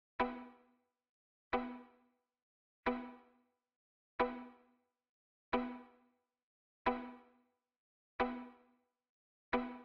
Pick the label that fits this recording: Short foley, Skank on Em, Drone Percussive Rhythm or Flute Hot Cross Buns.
Skank on Em